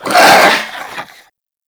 Head Shake; Warframe; Stalker

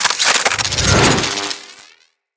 Equip Throw One Hand, Foley; Captain Vor; Nervo

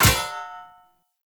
Short Sword Medium Parry Impact

